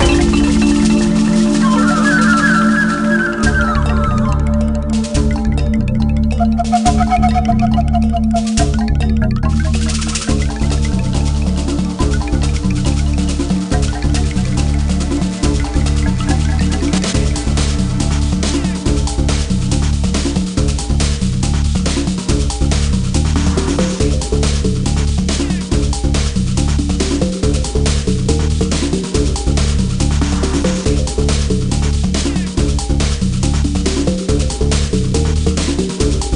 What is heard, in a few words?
Jungle Music Loop; bigfoot collision course; nds